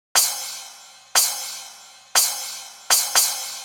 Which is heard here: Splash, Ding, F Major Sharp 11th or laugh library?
Splash